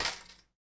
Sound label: file trash